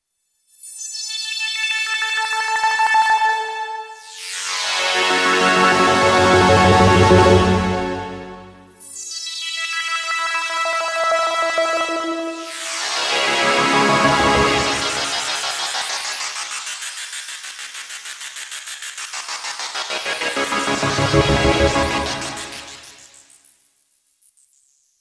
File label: sweep hf; micromonsta2